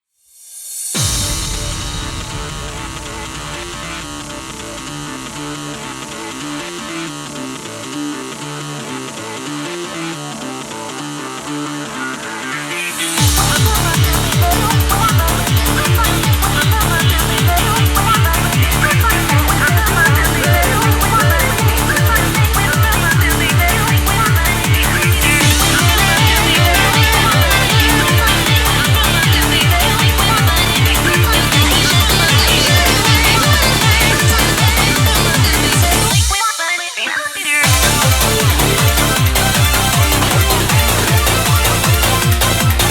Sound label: EUROBEAT Remix; 東方紅楼夢7